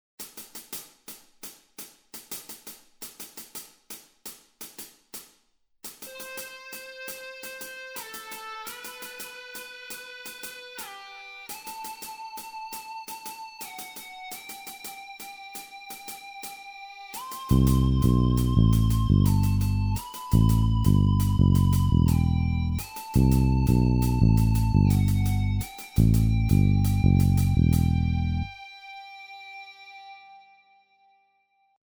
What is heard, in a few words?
tap intro